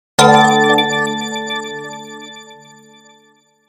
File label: Logo Marimba Vibraphone Delay; Sonic Logo; 5.1 STEREO MIX; Blastwave